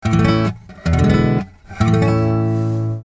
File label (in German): Die auflösende Wirkung des Dominant-Septakkords E7; Harmonielehre